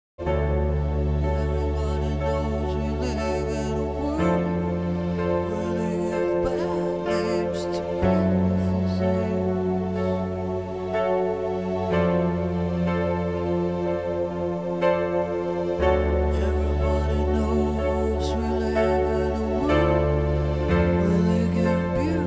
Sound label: Beautiful piano